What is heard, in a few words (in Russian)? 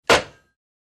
phone